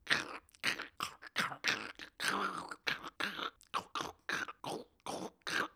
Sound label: Big Buzzer Crunch Idle; gamerip